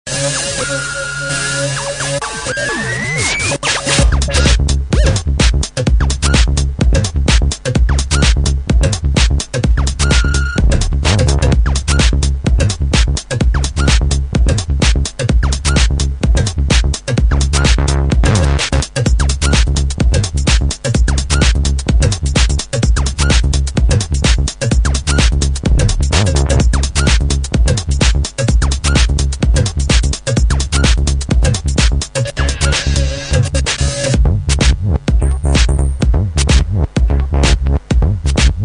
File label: haus trap